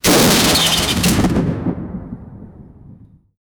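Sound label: lightning strike glass shatter; destruction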